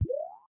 Obstacle Portal; In Obstacle Portal

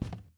Footstep Chair-Cushion

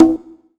bongo; bongo1; Donkey Kong Country Returns HD

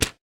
Toaster; gamerip

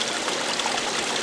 FOUNTAIN; Gothos

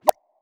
Grab

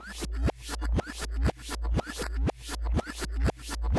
Speaker-Mic Glitchhouse Loop Rev; viewfinder

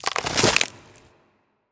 Revive; Skell

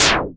Beam

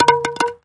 Baseball

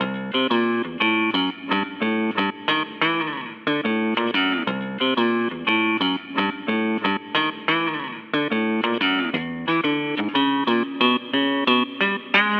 Plaza Ambient; Inkopolis Plaza

